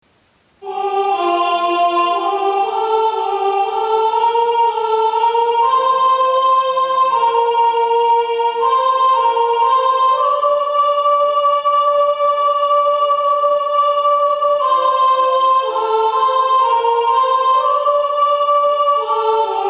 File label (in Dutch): sopraanstem; sintjanskoor